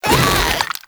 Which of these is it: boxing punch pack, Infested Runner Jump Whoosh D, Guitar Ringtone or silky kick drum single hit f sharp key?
Infested Runner Jump Whoosh D